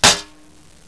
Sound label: metal, debris